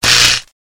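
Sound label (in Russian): электрошокер удар током разряд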